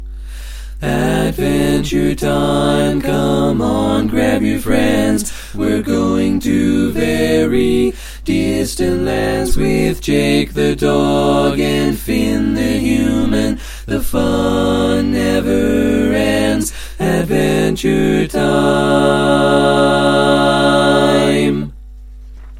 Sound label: All Parts, barbershop tags